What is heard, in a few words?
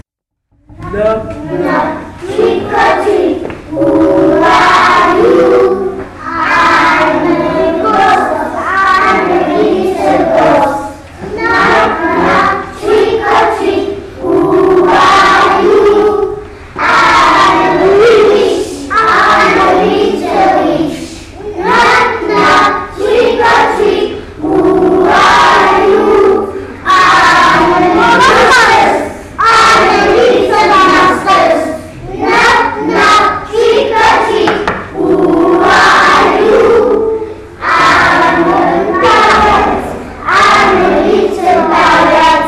knock knock